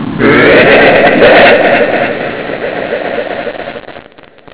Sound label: gurp; quake2; andross